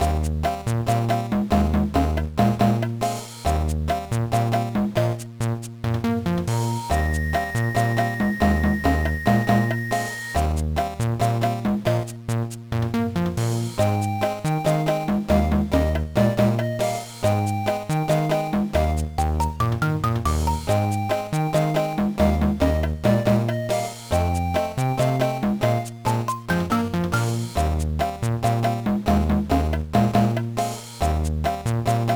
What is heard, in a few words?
BGM; ochaken kururin honwaka puzzle de hottosho; gba